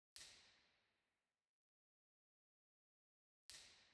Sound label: Musikverein, Theatres